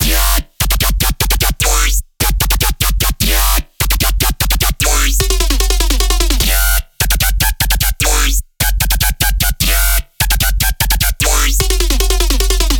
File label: Raptor Drop Loop 150 BPM F# min, Dubstep, Bass Loops; Synths; Cymatics; Dubstep Starter Pack